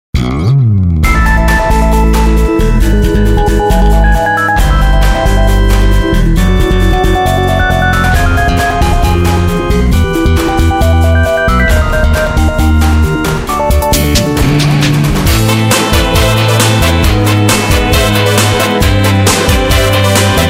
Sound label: BGM